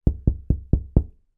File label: wall person knocks thin wooden x5, household